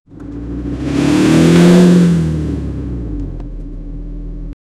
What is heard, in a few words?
the car starts driving; submerge